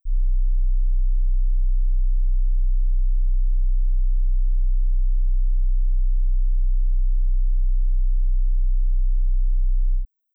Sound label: Sinewave 31,5Hz; Sinuston 31,5Hz - 10s